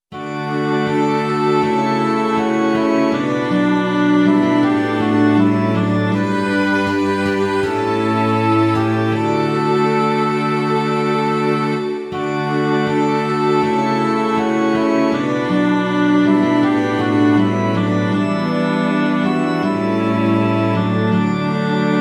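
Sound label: like a deer, Oboe Recorder Guitar Organ Strings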